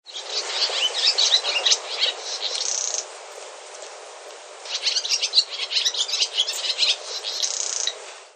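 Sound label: barn swallow; ツバメ; かほく市の田舎を訪ねた2004年春。; 民家のあるところも、すごい速さで低く飛んでいました。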